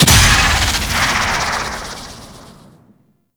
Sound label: Grenade Explosion Blast; Infested; Zealoid Prelate